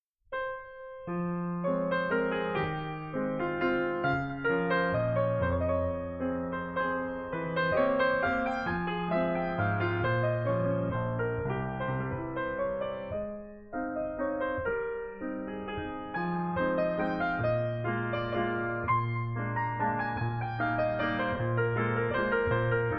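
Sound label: Waltz Opus 70 No 2 in F minor; Chopin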